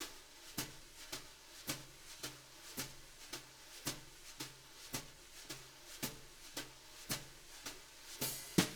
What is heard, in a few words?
BR, BRUSH LOOPS; Sampleheads; Peter Erskine